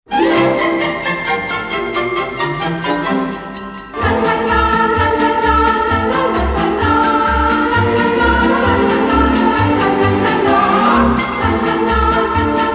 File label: Jingle Bells; Winterizer; ratchet clank into the nexus